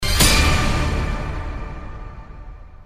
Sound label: stinger